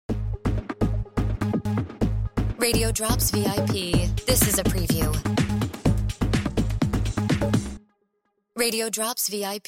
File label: Music Bed